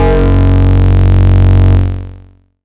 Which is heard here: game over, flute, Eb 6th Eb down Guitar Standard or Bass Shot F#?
Bass Shot F#